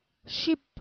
ship

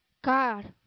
car

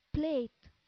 plate

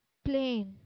plane

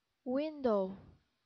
window

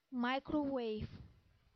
microwave

